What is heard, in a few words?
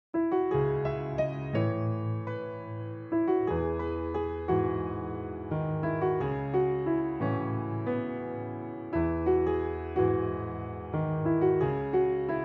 Piano Pop